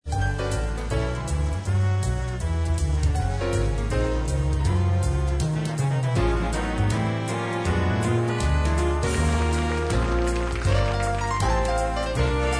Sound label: jazz club